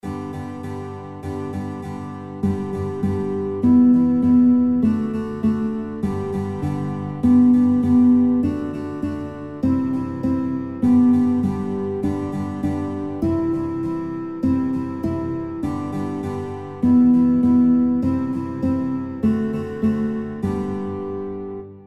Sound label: Guitar; Guitar Songbook; Taler Taler Du Musst Wandern; kinderlieder gitarre playalongs